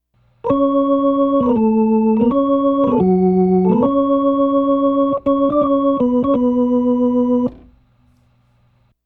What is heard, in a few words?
Soul Organ Riff, 70s Analog Funk